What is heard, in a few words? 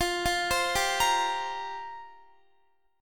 F Suspended 2nd Suspended 4th F down Guitar; Fsus2sus4; arpeggiated